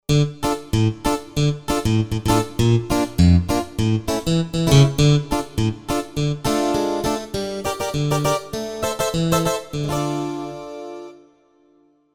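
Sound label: Guitar Harpsi Gtr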